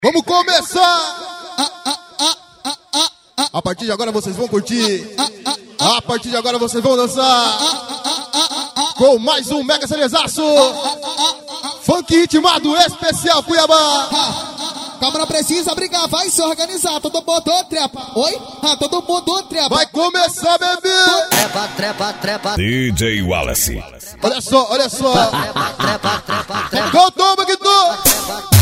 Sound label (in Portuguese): funk ritmado; especial cuiaba